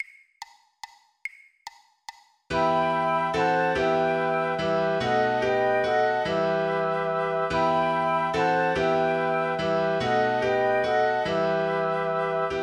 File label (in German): Flöte Klavier; We Three Kings; 72 bpm, 2x; weihnachten